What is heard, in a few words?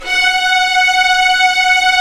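string section; Roland - LA Composer vol. 1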